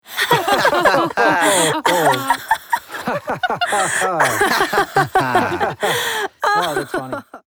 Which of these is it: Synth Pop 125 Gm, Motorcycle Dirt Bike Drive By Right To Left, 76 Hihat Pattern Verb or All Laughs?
All Laughs